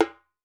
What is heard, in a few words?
WALK DRUM, African Drums; E-MU Formula 4000 Series Vol. 4; Earth Tones